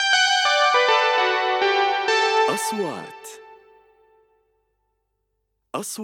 intro keys

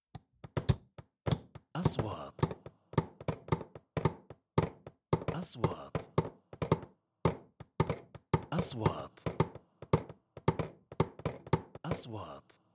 Wood

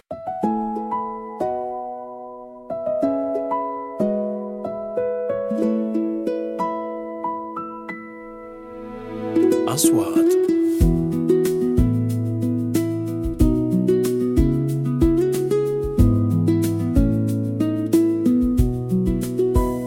advertisements

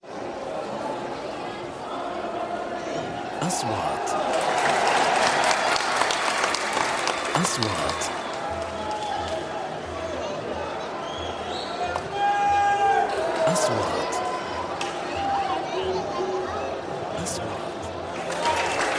Applause of a football field

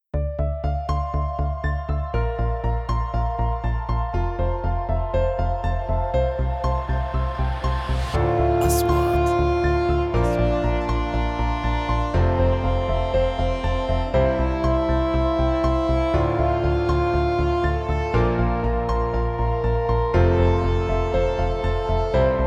corporate

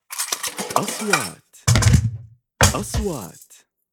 locker open close iron metals; keys

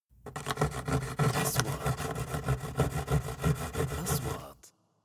Wood

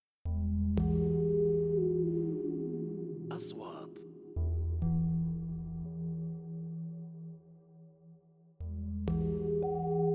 3D SFX; مؤثرات صوتية

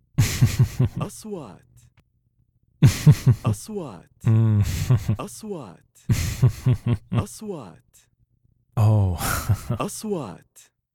MAN SMALL LAUGH

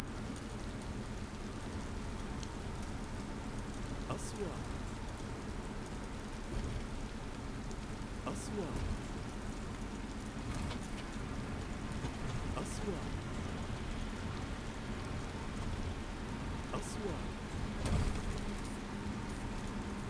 Rooms Tune Rainy road

